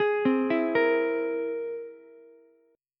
C Augmented 7th, Ukulele; arpeggiated; JGuitar

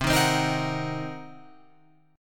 C Minor 7th Flat 5th Guitar Open F, chord

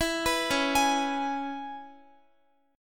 C sharp Minor 7th C sharp Guitar; C#m7; arpeggiated; x,x,14,16,13,15

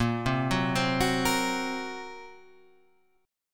A sharp Suspended 2nd Sharp 5th A sharp down

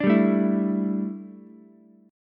D Suspended 2nd Suspended 4th D down Ukulele; strummed

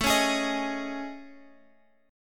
A Major 7th Flat 5th, down Guitar Open F; AM7b5; strummed

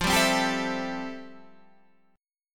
A-Minor 7th F; Am7/F; strummed

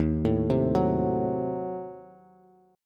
Eb Diminished 7th, Bass; Ebdim7 arpeggiated; 11,12,10,11; JGuitar

